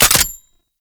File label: Gunbrella Low Clip Mech; Weapons; Warframe; Tenno; Koumei